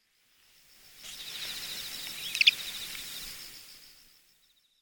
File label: kiriai, Sakata